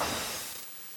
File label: CRASH, NES DPCM